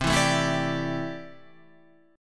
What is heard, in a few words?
C 6th Add 9th C down Guitar Standard; strummed; JGuitar